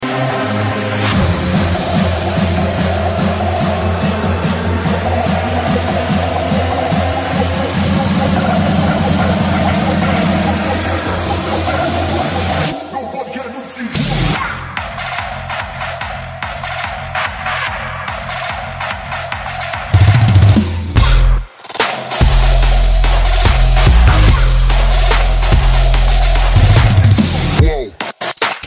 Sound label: trap house drop test